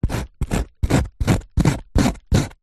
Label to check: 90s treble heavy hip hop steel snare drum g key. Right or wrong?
Wrong